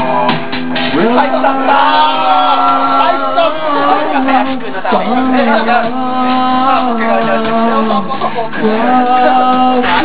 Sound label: Karaoke